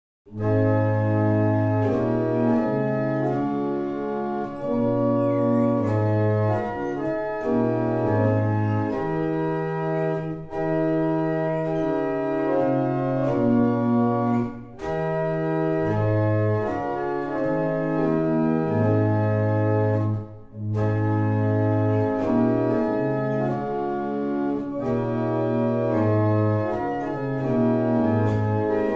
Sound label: Double chant, barrel organs; Bressingham